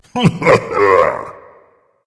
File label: heh heh; smite; ares